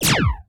attack, sniper, weapons

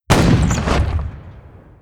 engine attack; weapons; dota; creep

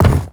stone equip; inventory

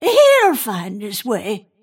move pain; snapfire

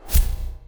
psi blade; templar assassin

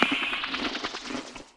forager munch; dota

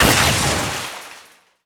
thunder strike target; weapons; dota; disruptor

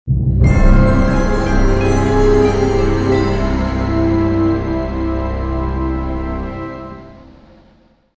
music stinger; stingers

